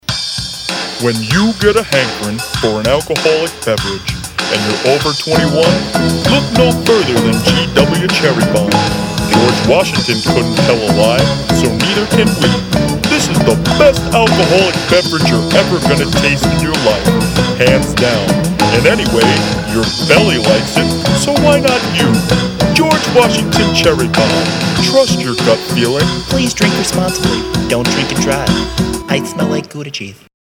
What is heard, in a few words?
jingle